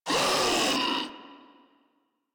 Proto Infested Ancient Player Spotted, Enemies Vocals; Warframe